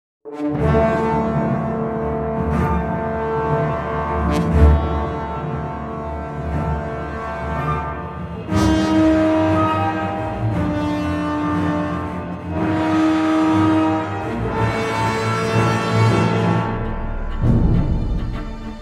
Music ui startup; Dota 2; The International 2015

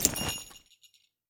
Item pickup ring shop

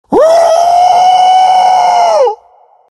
howl; lycan

